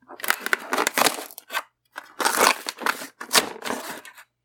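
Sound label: open cardboard box; Cardboard box open (sound effects); Visual-novel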